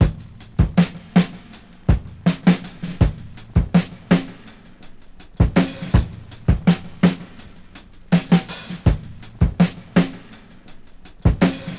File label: 85 bpm drum groove D# key, Free drum groove - kick tuned to the D# note. Loudest frequency: 703Hz